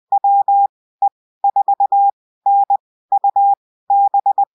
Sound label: MORSE CODE HAM CQ